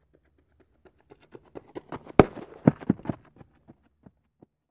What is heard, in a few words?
Basball FS Runner, baseball; baseball fx & games